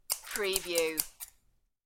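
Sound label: Quick Scissor Hair Cut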